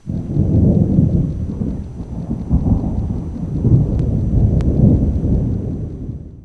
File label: thunder